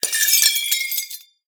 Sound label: Mana Geode Death